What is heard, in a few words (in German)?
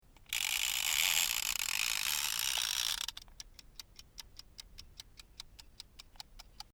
Uhr